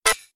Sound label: Bonus click; Pirate Quest